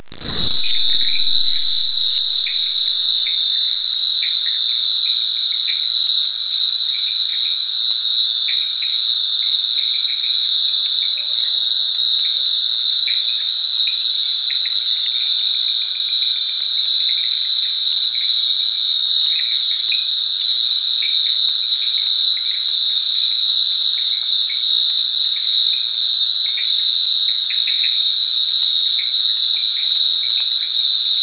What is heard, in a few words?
frogs and insects, Borneo; by jetty at 1925hrs; Camp Leakey; Visiting the orangutans at Camp Leakey; The Orangutans of Borneo